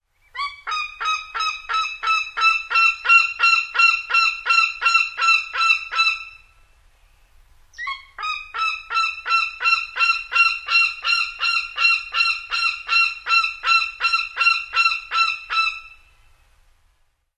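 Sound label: alarm calls, Northern Goshawk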